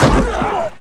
Football Hit Tackle

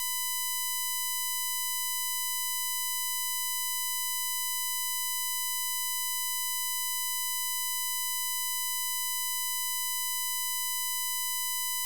Hospital Life Support; Medical; Blastwave

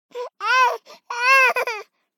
BABIES GROUCH Boy 12 months; Baby Boom

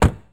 Prowler Door Close, Automobiles

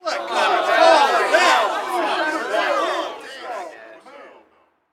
street ball crowd boo; Crowds

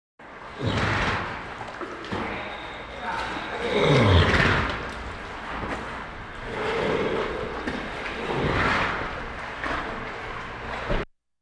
Animal effects snorts grunts farm ambience

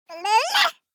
BABIES TALK Boy 12 months Loud; Baby Boom

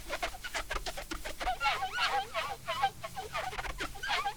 Squeak Polish Statue; SFX Kit

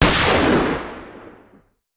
Hand Gun; Sound Ideas; Guns

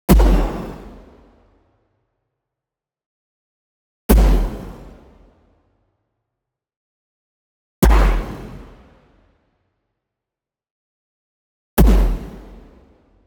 EXPLOSION SHORT Breath Tail; boom library; Anime Essentials

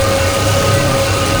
Scifi Forcefield Loop; SFX Kit; Sci-Fi Loops

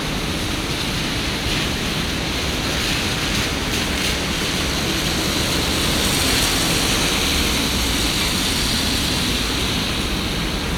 street atmo cars rain; best service Blue Box

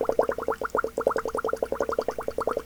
Water Bubbles Bong Loop; SFX Kit; Foley - Misc

